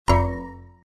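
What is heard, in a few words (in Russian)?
звук ошибки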